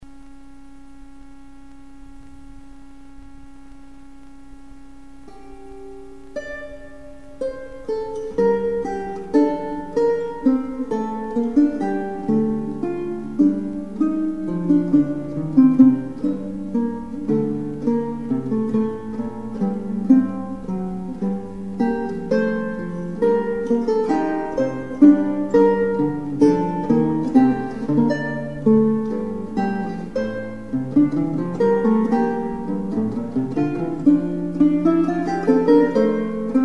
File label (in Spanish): vihuela; La ilustre fregona